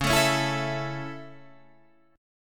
C sharp Minor Sharp 5th C sharp Guitar Standard, chord; strummed; 9,12,11,9,10,12; JGuitar